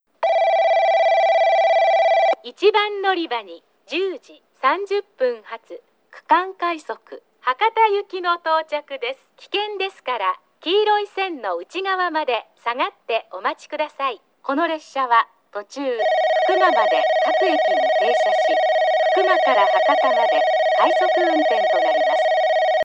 regional rapid Hakata; tobata

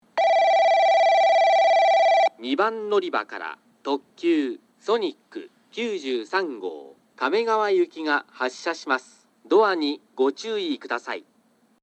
kurosaki sonic kamegawa

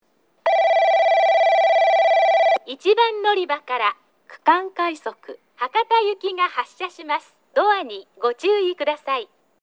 regional rapid Hakata; tobata

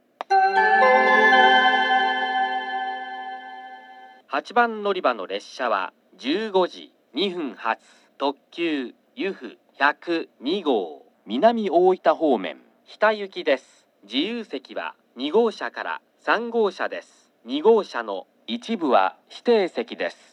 oita yufu hita

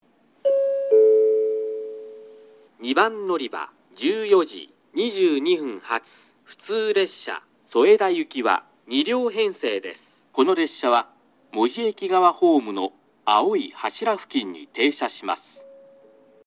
local Soeda; kokura

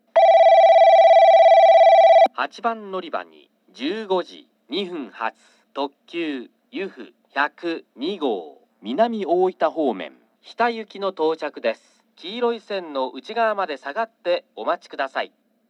oita yufu hita

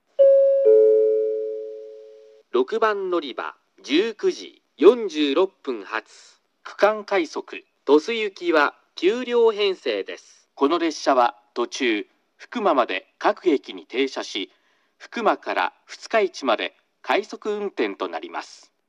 regional rapid Tosu; kokura

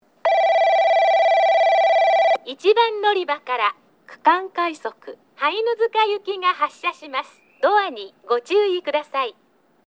regional rapid Hainuzuka; tobata

